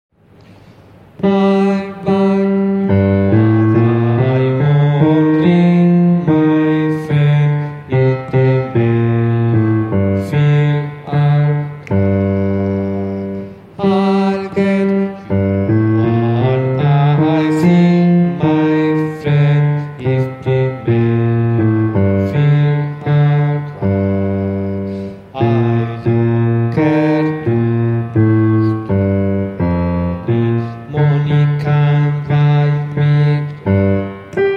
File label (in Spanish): bajo